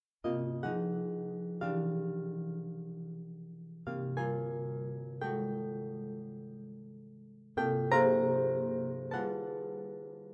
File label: Arpa